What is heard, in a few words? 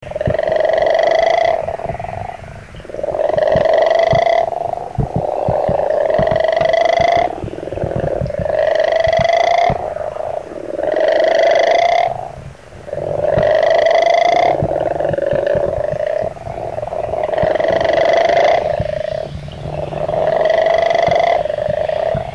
Western Leopard Toad